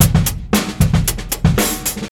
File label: PEARLS 114, DRUM